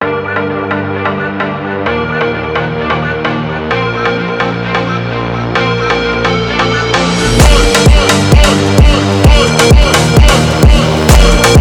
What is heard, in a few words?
atomic funk